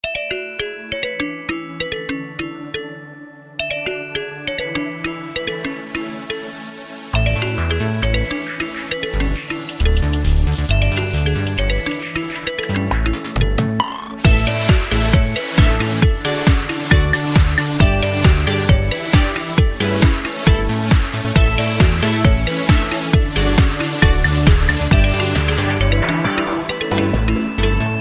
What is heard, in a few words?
Trance; Nokia